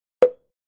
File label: Button Toy Drum Hit